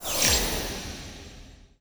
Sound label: Standby